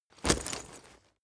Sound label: leather metal impact chink